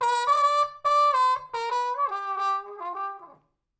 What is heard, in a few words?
trumpet; RollJordanRoll